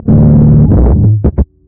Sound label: bass stopping